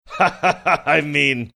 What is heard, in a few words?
laughing saying i mean; stugotz